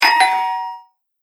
Old Doorbell Single Press; Foley Sound Effect

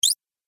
Multimedia Squeaky Beeper; Beep Sound Effects; Andrew Fleming

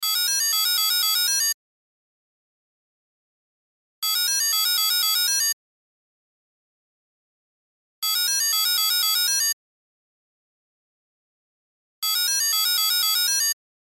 Cell Ringtone; Loop; Cell Phones; Electronics

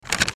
TRANSITION, Transition Page Forward; Transition Bright Slide Descend; Multimedia; Blastwave

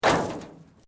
METAL SHEET MOVEMENT; Materials

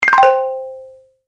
Deep Bonus Win, Video Game Sound Effects

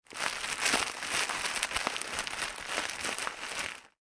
Plastic Packaging Movement

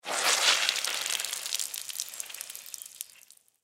Sponge Squish Squeeze Water Drips Paper Towel; Squishes; Household; Blastwave

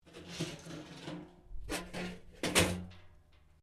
Pipe Tin Move; Metal; Materials

